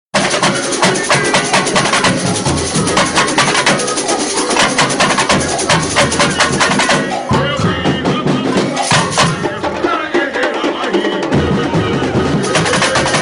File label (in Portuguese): Tamborim ensaio tecnico Sambodromo; sesimbra; Portela 2012